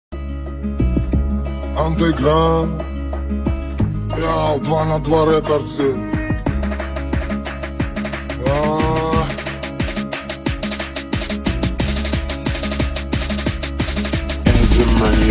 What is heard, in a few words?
attitude ringtone bgm ringtones english ringtone; bad boy ringtone